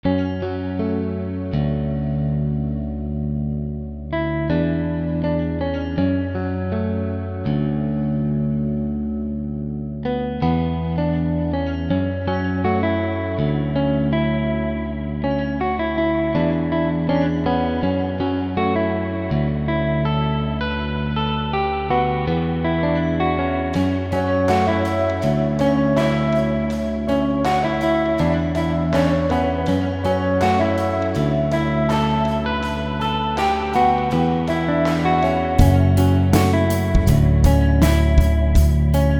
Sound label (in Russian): мелонхолия